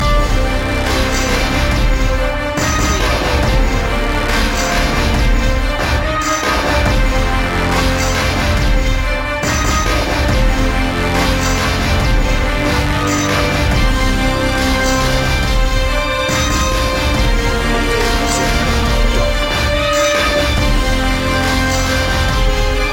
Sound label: colossus loop